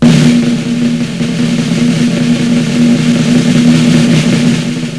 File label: drum; ouyou